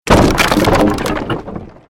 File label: created wooden structure crash medium